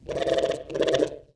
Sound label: AMBIENT; mahlendur